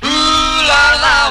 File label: ooh la la SUNG, Voice; ooh ahh